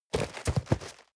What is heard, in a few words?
cardboard box medium turn hard short; objekte